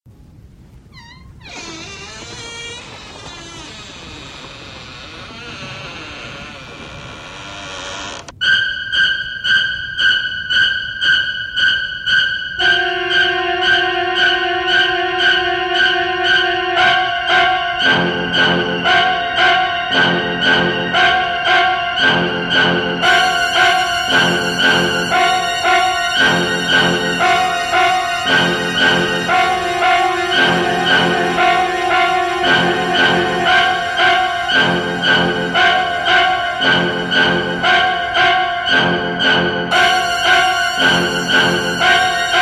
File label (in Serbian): muzička pratnja